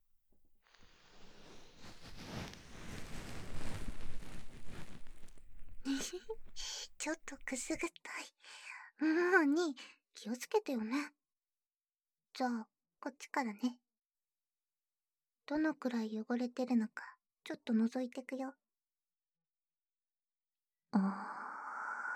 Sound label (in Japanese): 右耳かきパート, ASMR